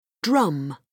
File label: drum; phonics